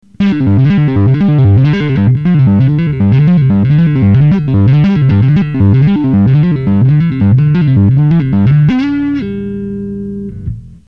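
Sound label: solo tapped, Bass Driver D.I.; sansamp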